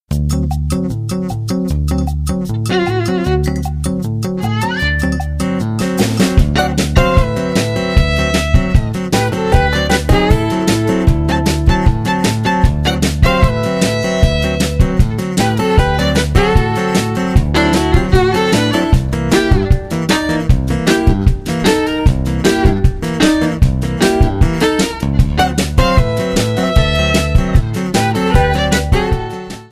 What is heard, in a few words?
String Ring Tone